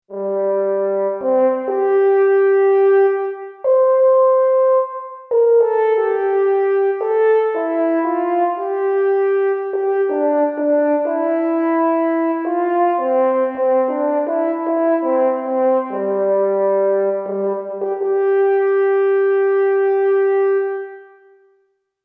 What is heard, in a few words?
Solo Fr. Hrn; xp-80; roland; Solo Fr.Hrn2 patch demo; roland demo cd-3